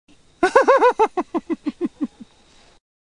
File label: laugh; magnums